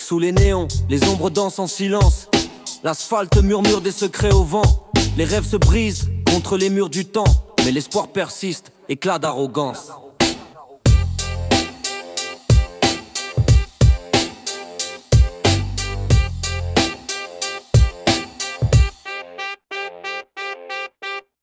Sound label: Bom Bap Rap; rimes embrassees